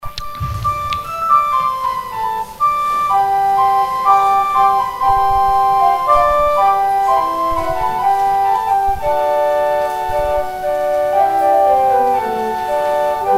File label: organ interlude